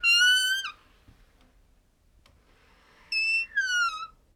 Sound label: Door Squeak Medium